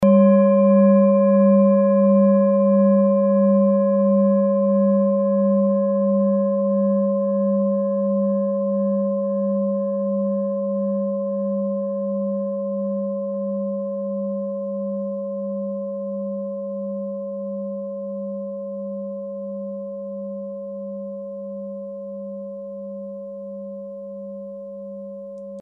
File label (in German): klangschale orissa